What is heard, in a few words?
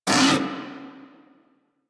Amored Vocal Pain; Enemies; Armored; Warframe; Kubrow